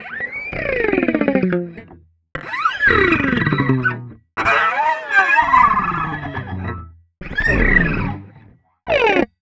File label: Guitar Pick Noise